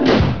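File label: tone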